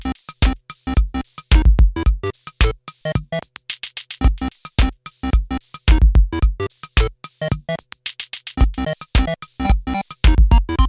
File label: Beep Boogie